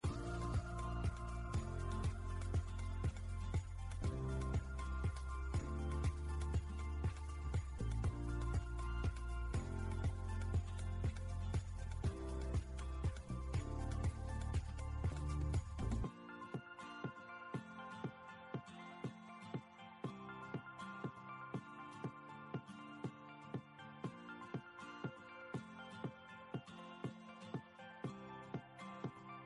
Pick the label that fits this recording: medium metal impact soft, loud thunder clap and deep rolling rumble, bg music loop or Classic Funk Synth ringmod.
bg music loop